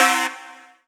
Synth Sound; Synth Shots; Vengeance Samples

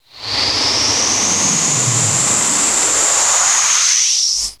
Uplifter

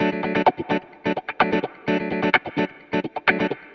Electric Guitar - D maj, 128BPM; Electric Guitar Kit; Vengeance Samples; Special Sounds

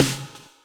tom sfx; DnB; Breaks N Beats Sound Kit; DnB Kit 1

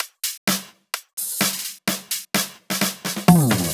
Fill Ins 128BPM; Vengeance Samples

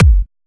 Uprising Kick; 128BPM; Vengeance Samples; Uprising Kit

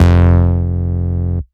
Root F, Bass

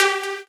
Synth Cuts; Vengeance Samples; VES1 Instruments

